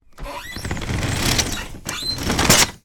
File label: van side shutter roll down in two stages; transportation; sound effects; sound effects one